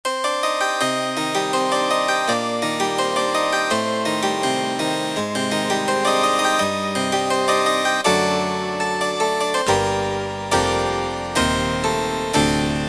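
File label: harpsichord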